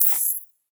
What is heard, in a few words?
UI Perk Buy; we are the dwarves gamerip 2016